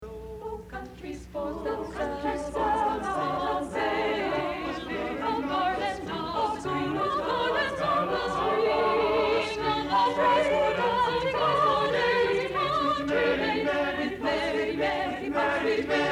Renaissance Singers; Lo Country Sports

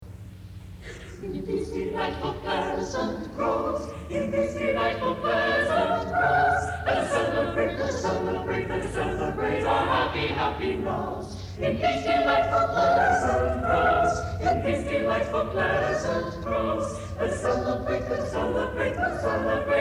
Singers; Renaissance; In These Delightful Pleasant Groves

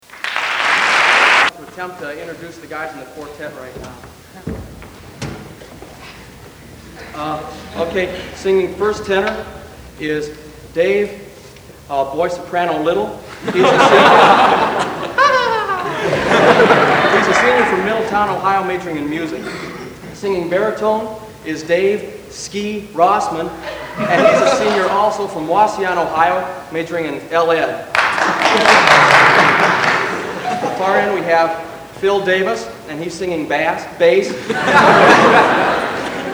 Mens Quartet with Introductions

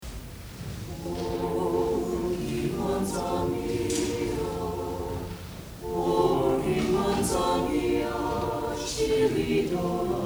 Renaissance Singers; Oh Occhi Manza Mia

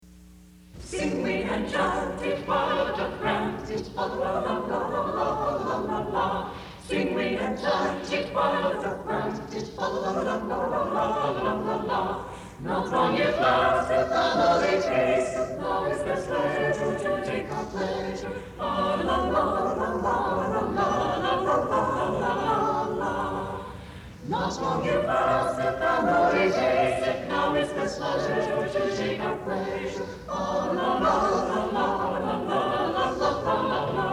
Renaissance Singers; Sing We and Chant It

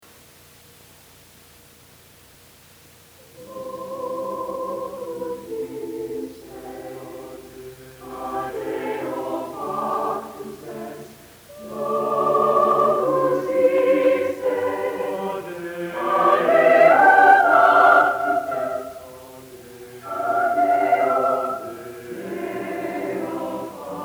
Chamber Choir Locus Iste

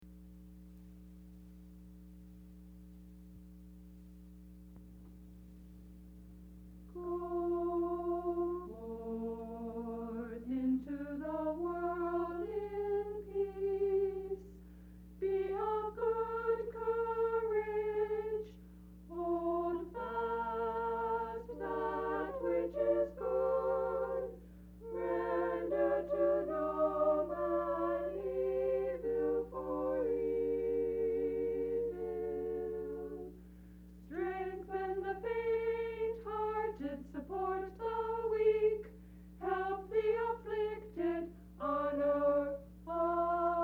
Girls Quartet; Go Forth; Miami U

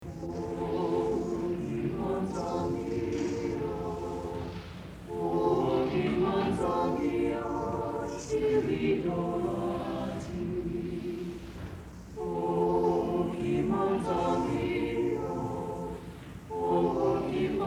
Singers; Renaissance; O Ochi Manza Mia

